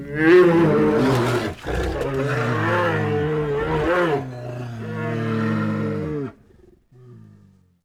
grunt; slender; aka manto

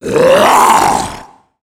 tank attack, voice

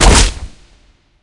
hit; slender

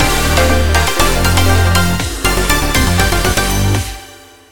game clear; tf2ware ultimate; music game; switch moveit cricket

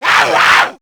kid zombie attack; nmrih; childrenofthedead; zerosiki; slender

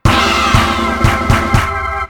victory; tf2ware ultimate; music game; wii mona

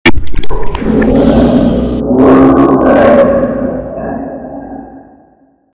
chasing; phonophobia; zerosiki; slender

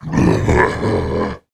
alert, male zombie; nmrih; zerosiki; slender

